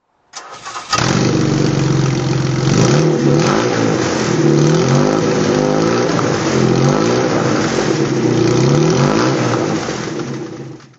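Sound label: jaguar 340 manual throttle, engine sound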